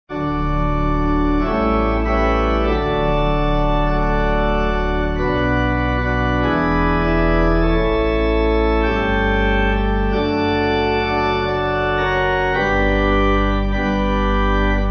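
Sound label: Pipe Organ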